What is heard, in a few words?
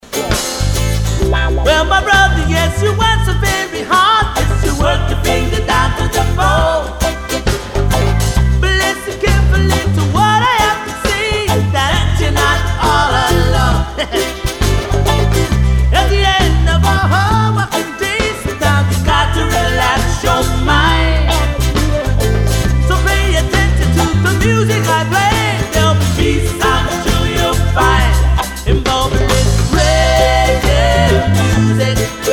Bubblin Reggae Music